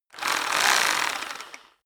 rock drill hammer rev double; soundpool

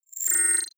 sweep rattle click; sweeps-swells

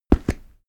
step stone step; schritte

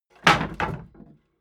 wood board drop rumble; objekte; holz

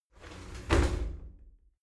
shower slide doors close heavy

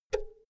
power cord heavy insert remove; objekte; elektronik

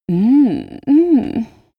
female moan; koerpergeraeusche; menschen; soundpool

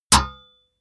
metal impact sword plate

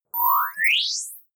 screen turn on bubble; energie

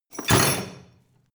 crash medium chain smash

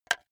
wood board hit; objekte; holz

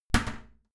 toilet lid slam heavy; haus-garten; badezimmer

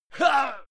male fight attack; schreie; menschen; soundpool

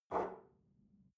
espresso grab from table soft

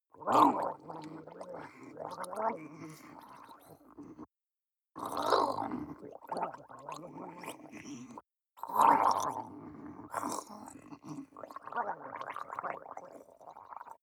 water monster female gargle blabber; koerpergeraeusche; comedy-cartoon; soundpool

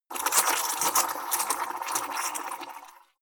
milk frother roomy; berufe

